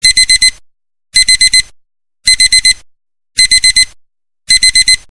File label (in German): Samsung Wecker; Klingelton